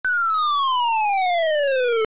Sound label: Fall, Falling in a hole; AOL